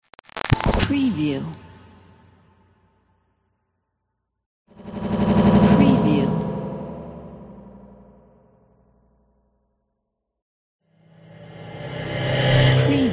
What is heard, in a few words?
glitch transition woosh